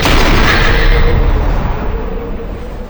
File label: yasuo base quickdraw wind; LoL